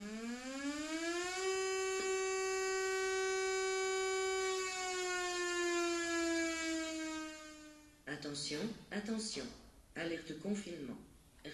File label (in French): Alerte confinement